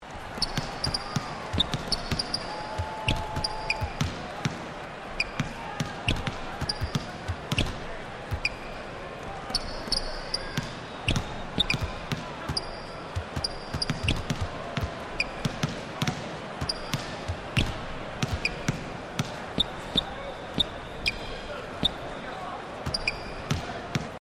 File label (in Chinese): 铺底, 战斗音效; nba; 战中